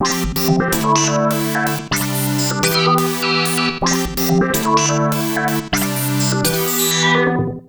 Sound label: Synth hits and riffs